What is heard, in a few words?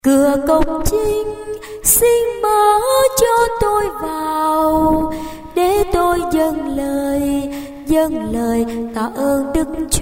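soprano tenor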